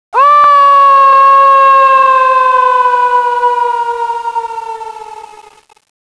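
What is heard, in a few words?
fall scream; ambience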